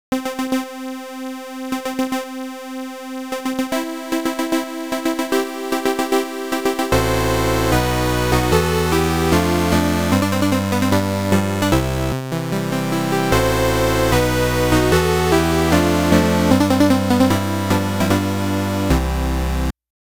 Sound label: completed music; Libble Rabble; BRIDAL